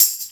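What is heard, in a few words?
tambourine, Percussion; InVision Interactive; Percussion Slam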